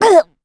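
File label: Damage, Korean; voices; heroes; Valance; kings raid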